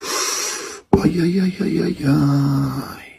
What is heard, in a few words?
ajajaj